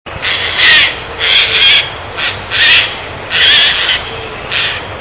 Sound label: garrulus glandarius